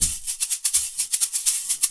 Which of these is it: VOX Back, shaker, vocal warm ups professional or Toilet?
shaker